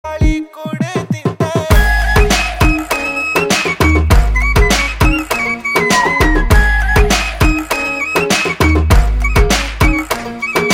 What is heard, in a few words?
Flute